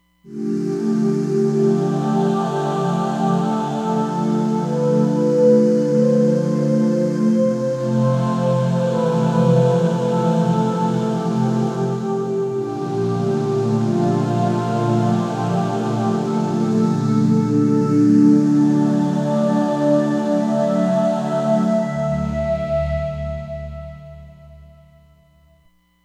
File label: Choir Ooh-Aft, Roland Fantom XR